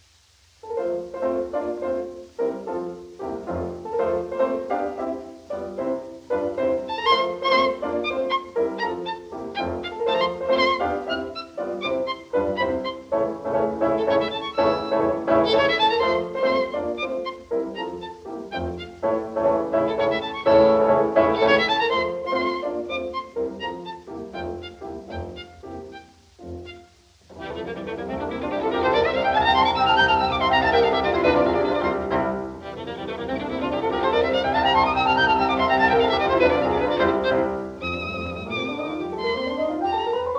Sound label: Violin Sonata; Beethoven; Violin Sonata No5; Kreisler